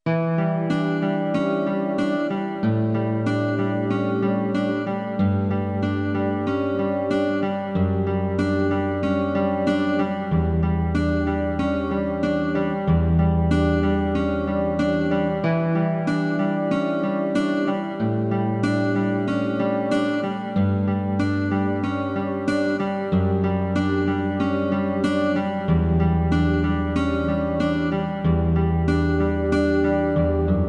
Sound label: Guitar Theme